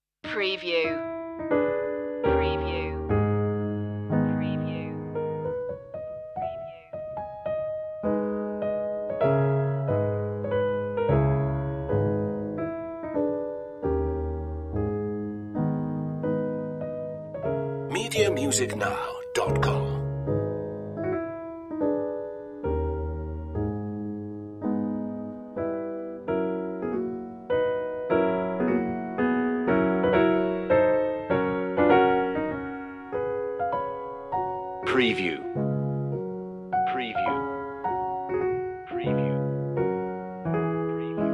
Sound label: chopin mazurka mesto; op33 no1